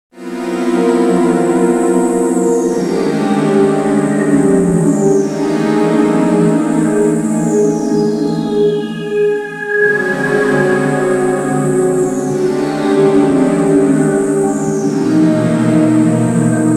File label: ENDING [Silence]; R-Type Original Sound Box